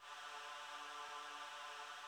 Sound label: BREATH, ALIVE INSTR; Soundengine - CSR-000 The Art of Transwaves